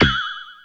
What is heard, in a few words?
cube bounce; 3DO; Portfolio 2.5